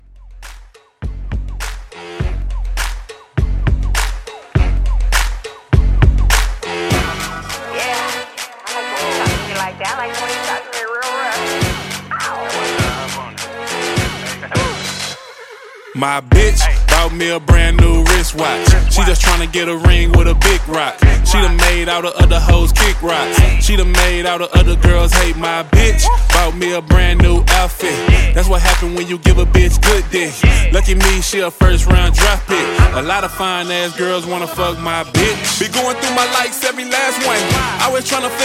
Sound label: Mashup 102